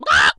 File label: hit chickens bukuk; Blind Drive